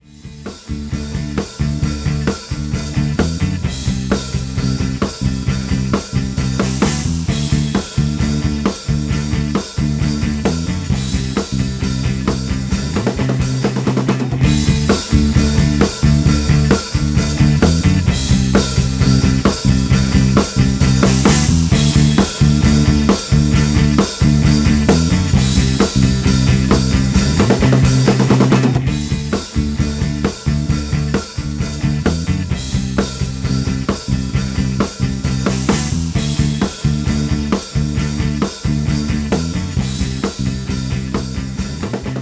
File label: Bettermaker Mastering Limiter Limiter plus Clipper